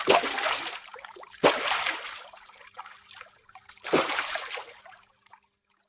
Face Washing

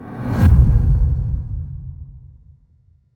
Deep Whoosh Slow Motion; slow motion transition

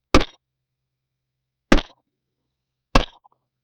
Cartoon Punch Hit

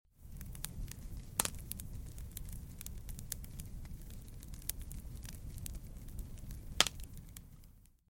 Fire Crackling

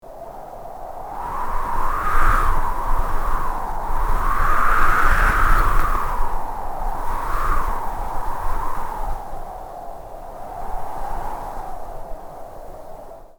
Wind noise

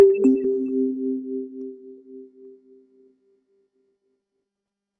Cute Pop

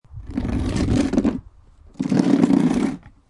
Chair Dragging

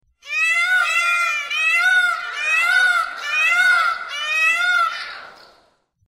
Peacock Call; birds

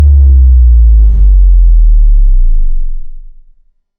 Sub Drop Slow Motion; slow motion transition

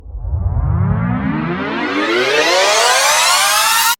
Fast Whoosh Riser

